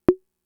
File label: Conga, Fricke MFB-522